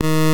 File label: error beep